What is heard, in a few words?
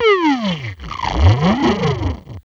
FX, Grunge Hip Hop; USB Soundscan vol.34; AKAI; RNB 101